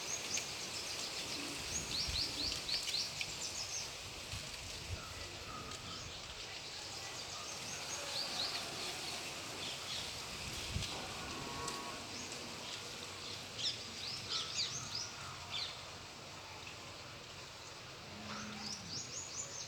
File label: Nature